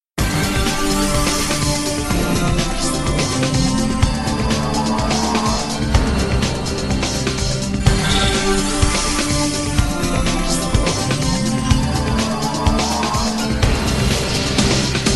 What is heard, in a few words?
hyperspace theme music 3do version